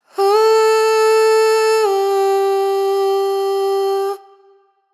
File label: riff Long Ooo Dm wet, Vocal; Slate Digital Omnivox Vocal Sample Pack